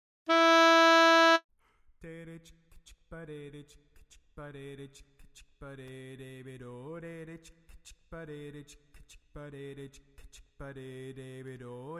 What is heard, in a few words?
Carol of the Bells Lead, Grand Chorus